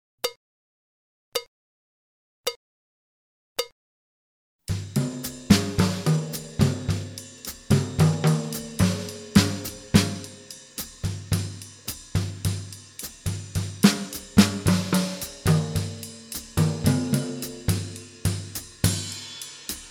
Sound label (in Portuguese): Variacoes melodicas na bateria; Jazz Harmonia